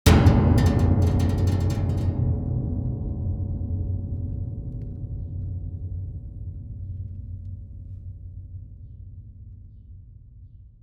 piano gesture strings impact heavy metal bar, Prepared Piano, upright piano